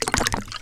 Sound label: Water Dribble